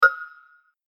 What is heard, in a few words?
click